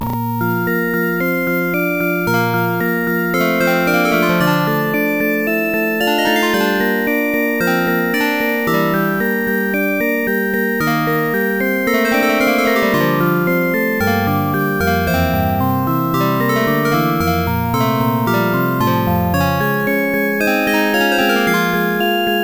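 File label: background music; Legendary Wings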